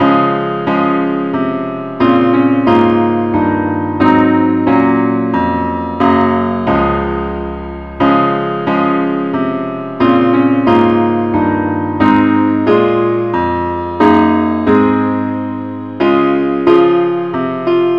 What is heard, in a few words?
parts only key des